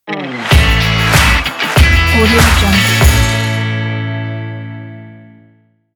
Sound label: podcast intro stinger